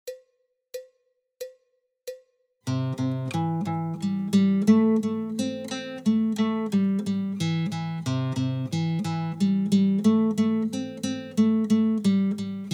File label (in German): Walking Bass, Gitarre